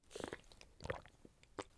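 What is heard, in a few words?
tetrapak with cap drink; Escape from Tarkov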